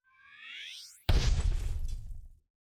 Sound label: Dynamite with sensor